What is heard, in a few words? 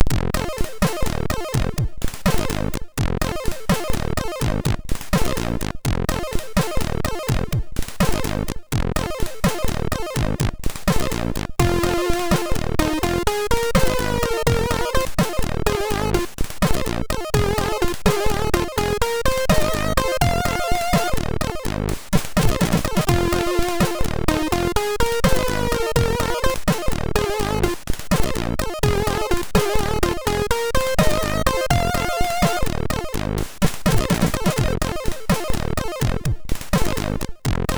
Jazz, sid MOS6581R3